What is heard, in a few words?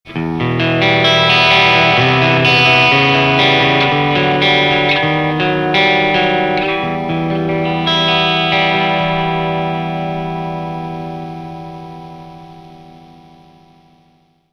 Solo Clean Arp, Guitar Effects Pedals; Pro Co